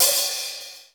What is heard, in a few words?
DRY HAT; DRY KITS; Crypton; DrumBase5000